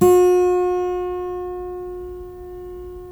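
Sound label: GUILD 12-string, Guitars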